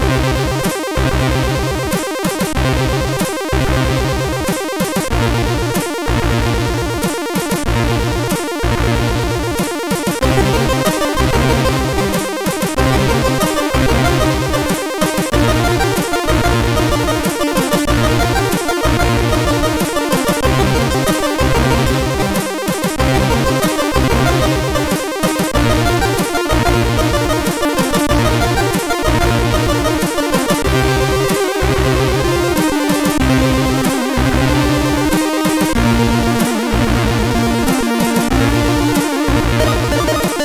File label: Crackle, MOS 6581 R3